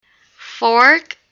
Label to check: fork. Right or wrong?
Right